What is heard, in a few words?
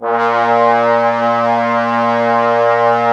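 brass trombones A#, Woodwind Brass Ensemble; 90 s Sample CDs; Miroslav Vitous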